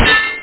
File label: clang